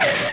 stop; MathPad 2.4

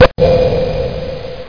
blip; Quake 2; Cry Havoc

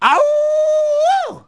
yowl; Wired for Sound Pro CD; Aristosoft, Inc.

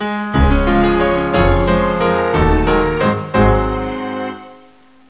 waltz (orch)

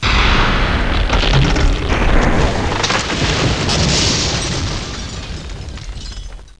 crumbl; Total Chaos Nukem 3d Pack; duke

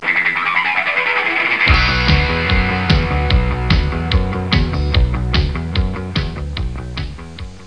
dance; My Science Fair

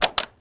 SWITCH; Hidden & Dangerous; h&d demo

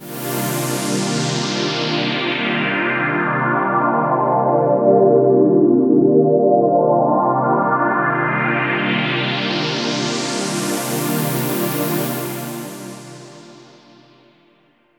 synth pad ambient 160 C; Sphere

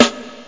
Korg Kit Snare

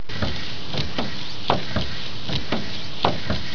wiper; 8bit

